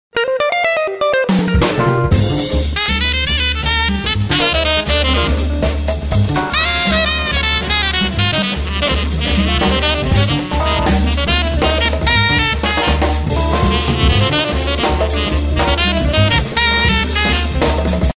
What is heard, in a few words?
Jazz Bar